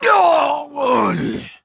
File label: Death